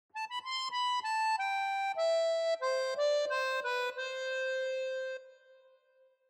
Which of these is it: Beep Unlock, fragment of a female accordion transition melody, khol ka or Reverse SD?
fragment of a female accordion transition melody